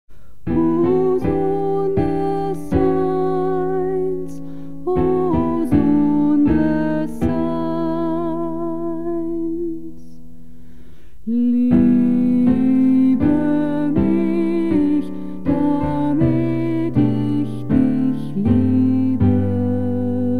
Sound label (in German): alto